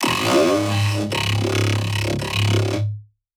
saw roar; random and out of place

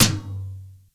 SYNC TOM, TECHNO; Crypton - DrumBase5000; TECHNO #2

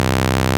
P5 SQR, Vintage; INIS - Vintage

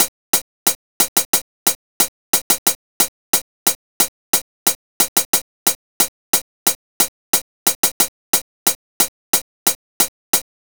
Hi Hat

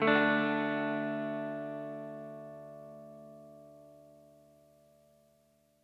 Soul Guitar Ending, 70s Analog Funk; Sonic Foundry (Sony Creative Software); What it Is!

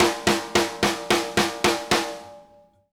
SNARE R, drum fills; Sampleheads - New York City Drumworks VOL-1

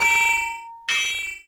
MET SW SIN, SWORDSING; Total Control - Action Cutz

